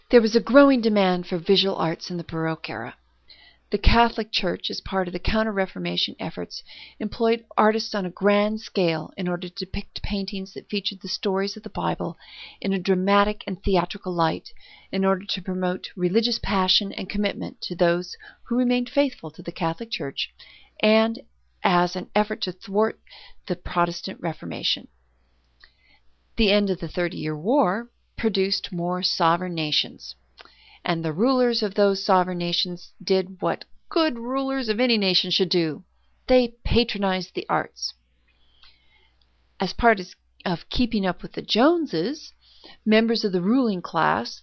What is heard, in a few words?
baroque